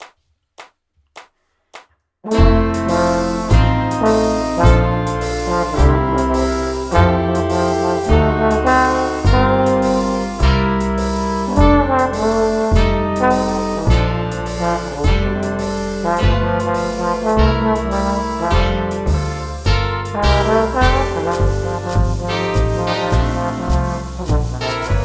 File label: Swing Low